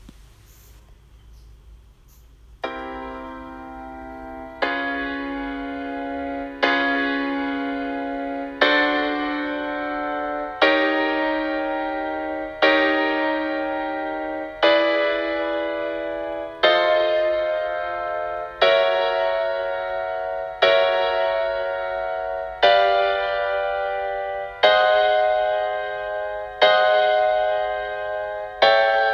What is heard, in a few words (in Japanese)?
セブンス・コード音